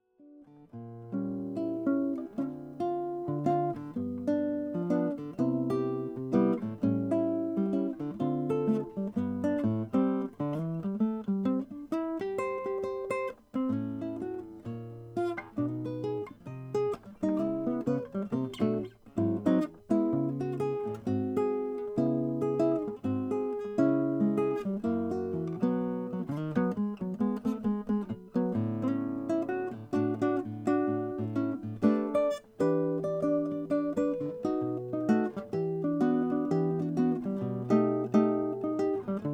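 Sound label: mcgill prototype super ace flame maple, guitars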